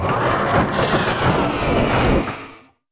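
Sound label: tiger engine die away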